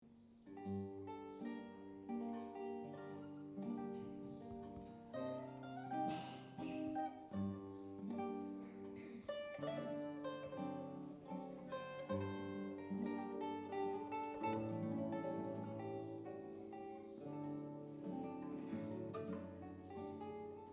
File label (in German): Saitenmusik Meditation G Moll